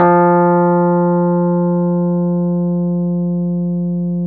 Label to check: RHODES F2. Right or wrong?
Right